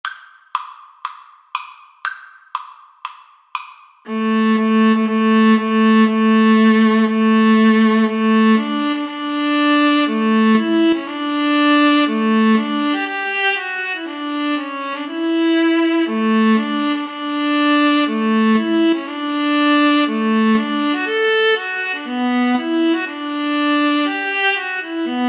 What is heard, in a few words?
wagner wedding march, Viola Cello Duet